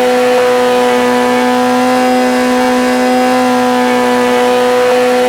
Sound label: simulated vehicles mid